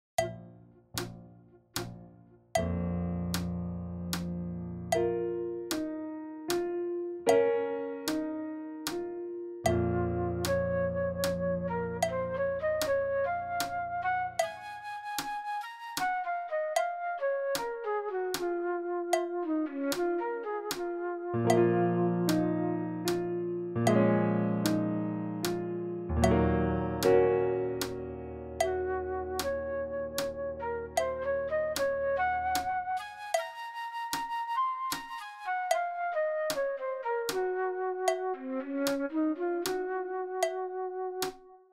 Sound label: piano flute fast